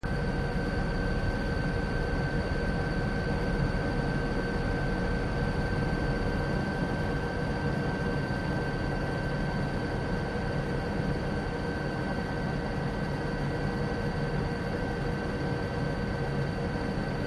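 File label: helicopter; MOBICLIC127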